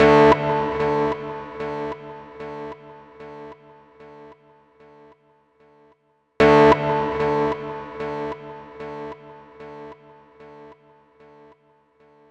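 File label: OUT guitar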